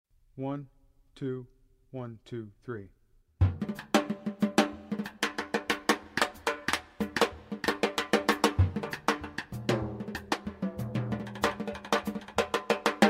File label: jazz piano; 2022 jazz audtion